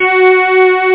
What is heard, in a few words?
bell string; bellstring(4okt); Amiga 8-bit Sampled Voice